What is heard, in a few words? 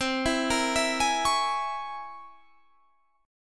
C 7th Sharp 5th Flat 9th C